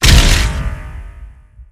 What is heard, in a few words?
resonator blast, weapons